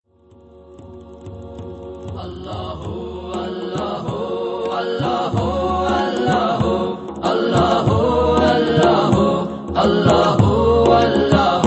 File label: allahu